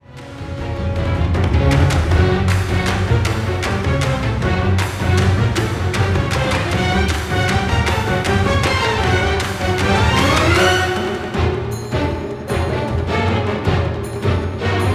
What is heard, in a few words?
perc; Virtual Orchestration